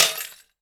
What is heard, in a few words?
box coin, handy percussion